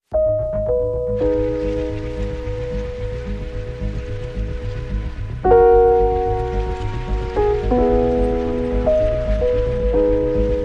Beatless

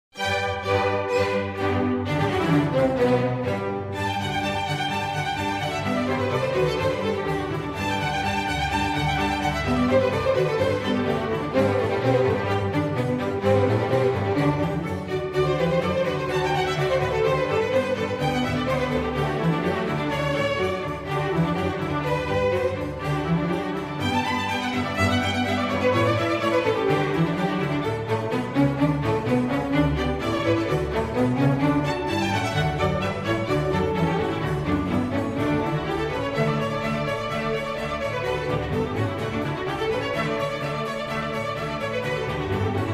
Sinfonia for Strings in G major; RV149; Antonio Vivaldi

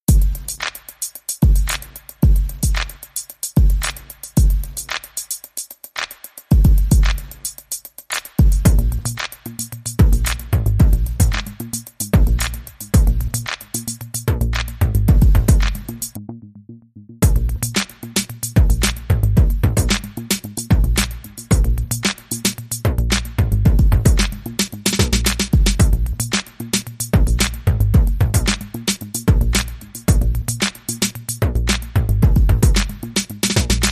Drum and Bass

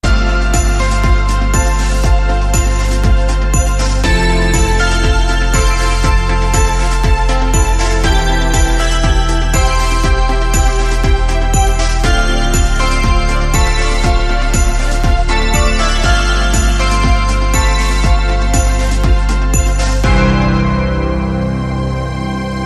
Sad Christmas, Rhythm

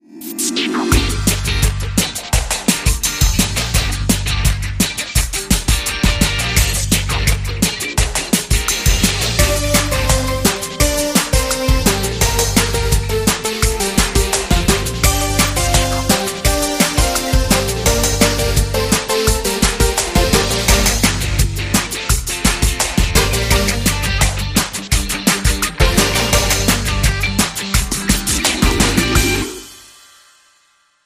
Pop Rock Chicks No Vox

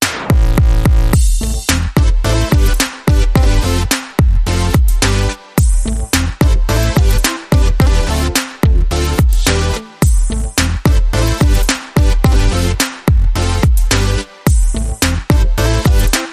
TRAP

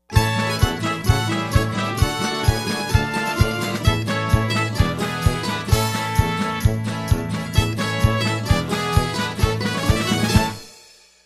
Sting

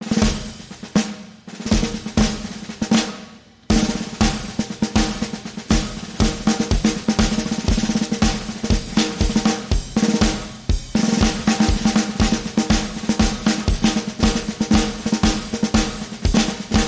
March, Drums Only

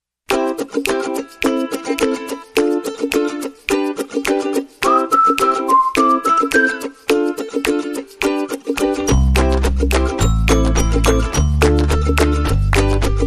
Quirky Ukulele

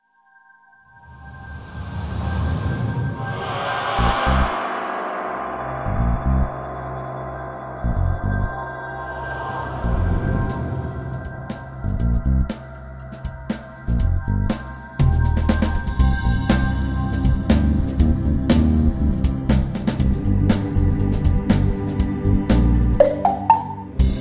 Harpsi Madness

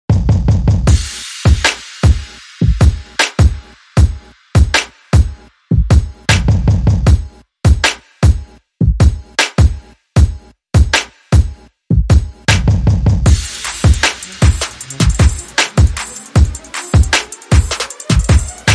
Door Knock Beat

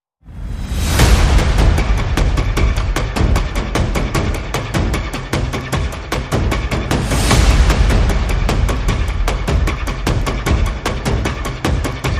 Percussion and Effects

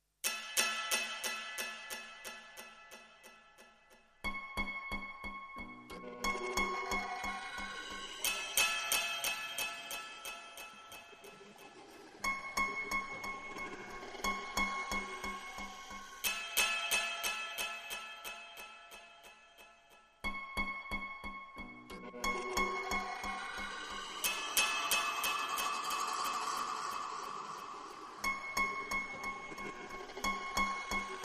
Guitar

